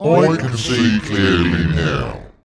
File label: VOICE FX, Dance Vocals